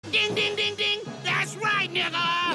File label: ding ding ding